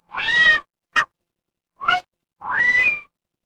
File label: animal cat meow